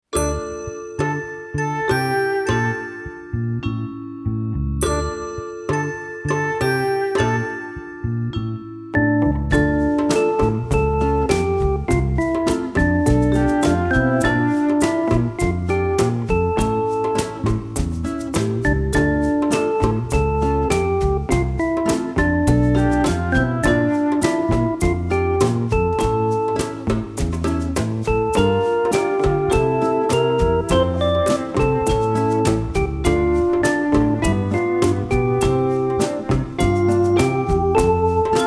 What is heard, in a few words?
god rest ye merry gentlemen instrumental